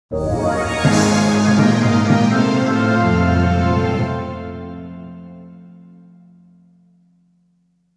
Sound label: Round clear; Gain Ground SX; TurboGrafx-16; SEGA